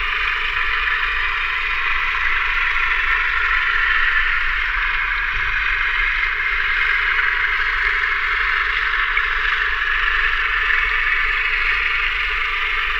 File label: boat